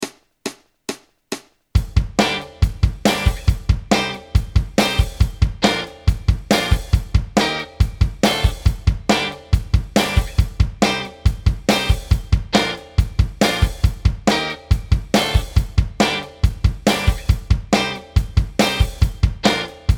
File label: octave pattern, bass playalongs